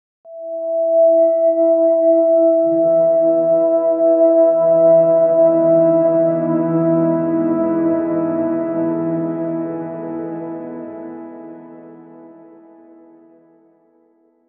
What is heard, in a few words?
light ambient with a grad